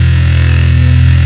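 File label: Guitar Doom Note; Mo' Phatt; Digital Sound Factory Mo' Phatt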